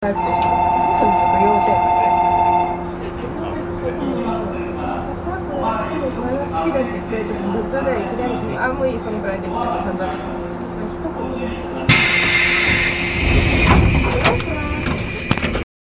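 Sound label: honchiba, departure bell; sotobo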